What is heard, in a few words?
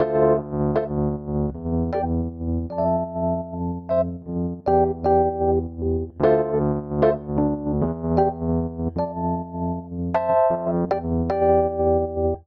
Vamp Downtempo i - ii - III D Minor 77 bpm, Electric Keyboards; Ableton; Factory Packs